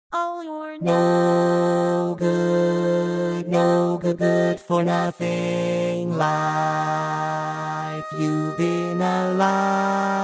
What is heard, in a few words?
Tenor, barbershop